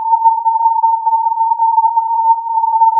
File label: filtered input